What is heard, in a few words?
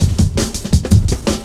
Hard Edged Cut, Drum Loops; Zero-G Jungle Warfare 1, 2 & 3